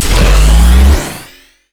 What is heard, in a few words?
overheat; rumble skin03